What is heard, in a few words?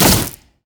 deadly bloom; LoL; zyra